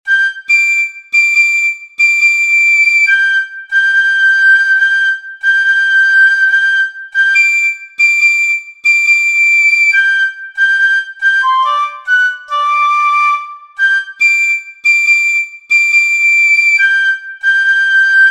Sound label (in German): Morgestraich mit 4ter Stimme Piccolofloete